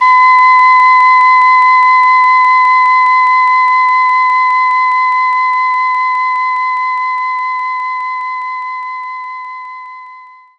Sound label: Whistle B4; knights try